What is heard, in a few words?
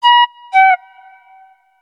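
cuckoo clock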